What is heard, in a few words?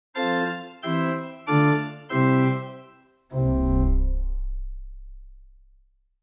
tail test organ